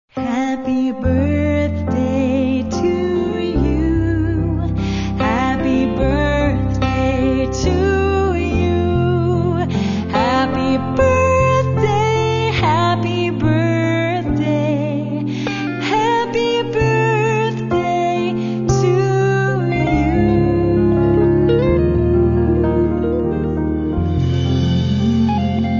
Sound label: Happy Birthday To You